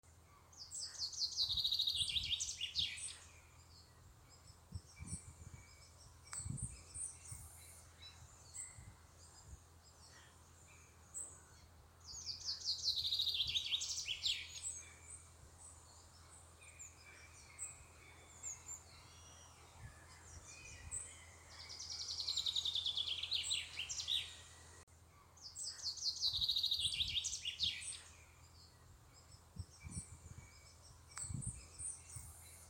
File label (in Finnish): animaailma metsa